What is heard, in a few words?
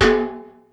Mid Trash Can, Yamaha DD-35